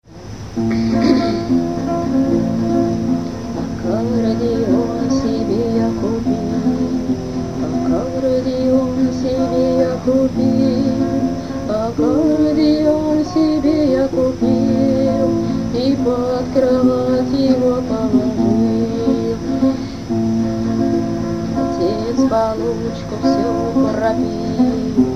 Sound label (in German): Akkordeon